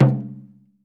CHINA DRUM, Ritual Drums; E-MU Producer Series Vol. 7 – Old World Instruments

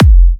F, kicks; markus hakala kicks

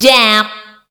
PHRASE, Voice Spectral

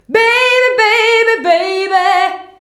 VOX, Singin

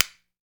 SPOONS; Jew's Harp & Spoons

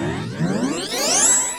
go up; Best Service; Hyper Dance; CONSTRUCT

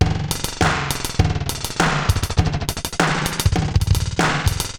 Digital Delay RX17 (100BPM), cyborg beats

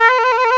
D#2, FLUTE; East West; Global Sounds

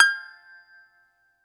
BAGLAMA G0XR; E-MU Producer Series Vol. 7 – Old World Instruments

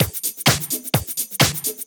Clubhouse Loop 128 BPM

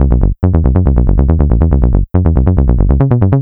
Bass Line; Eclectic Electronica

